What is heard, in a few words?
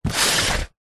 cardboard box parcel heavy slide wood deck external; zapsplat